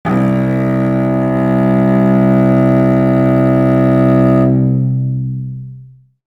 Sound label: Cello Solo Sus f C2